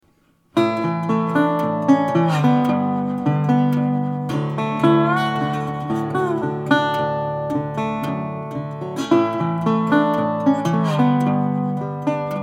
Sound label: Dobro Steel Nickel